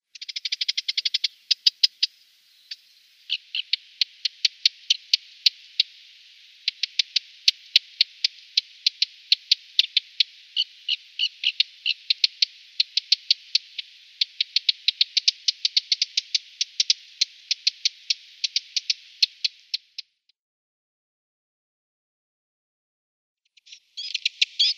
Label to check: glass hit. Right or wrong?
Wrong